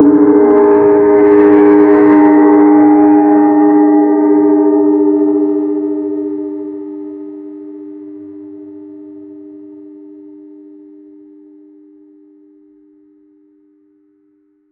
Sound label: chinese gong sound; ceremony